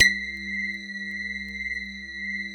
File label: C1, SynthLine; 90 s Sample CDs; NiceBeats; SynthLine VOL-20